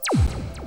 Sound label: laser, sentry gun; cstrike; CSSB